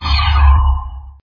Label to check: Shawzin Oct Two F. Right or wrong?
Wrong